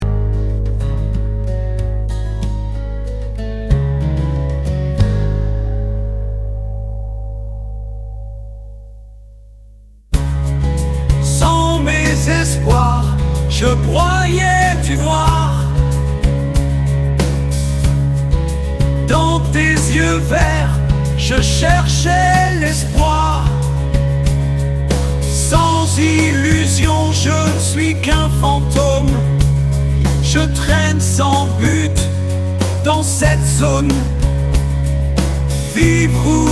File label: soft rock